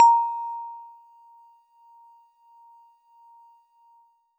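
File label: Warble Celeste DX100 A#0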